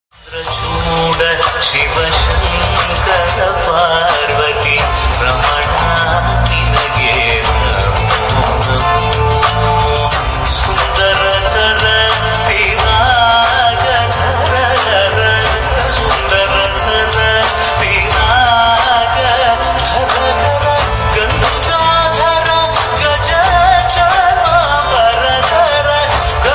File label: God Shiva ringtone Malayalam devotional ringtone; Malayalam viral ringtone